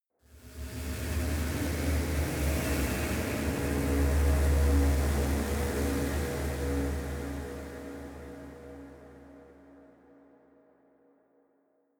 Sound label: space unknown evil spaceship; raumschiff